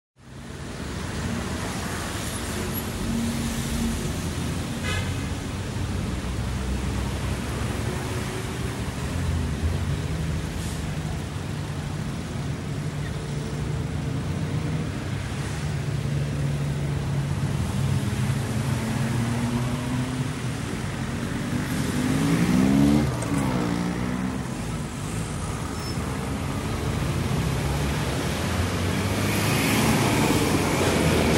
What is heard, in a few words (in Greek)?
Perivallon Hxos Ths Polis